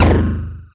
big noise hit

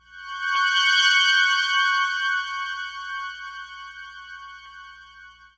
spooky minor strings; Orchestral

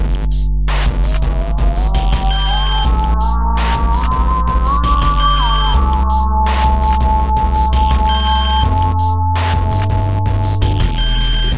083 distorted melancholy groove; SAMPLED MUSIC LOOPS

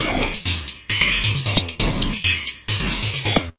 134 bpm, vox rhythm, drum loops and breaks; 131 to 140 bpm; samples-ghost

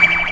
sonar

